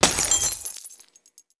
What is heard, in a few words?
Ball Glass; Tiger Woods